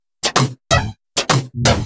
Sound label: Electro Loop 128BPM; Vengeance Samples